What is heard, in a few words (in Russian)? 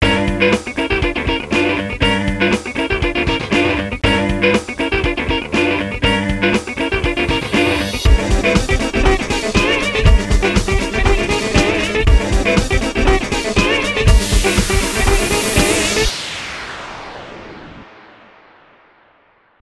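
funky казуальная электрогитара рекламный дизайн эстрада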